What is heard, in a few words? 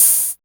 open hihats, techno; Techno ID; single-drums